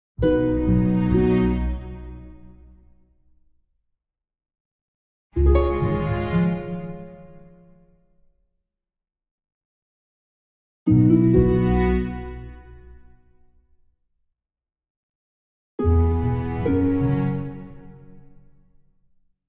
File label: Orchestral Jingle Neutral; boom library; The Interface